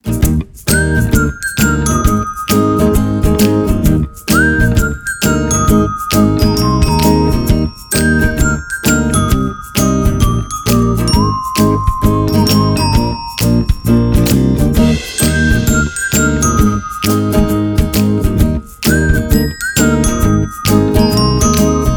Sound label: Twinklebell